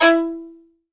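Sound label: violin staccato E 3; AKAI S-Series CD-ROM Sound Library VOL-7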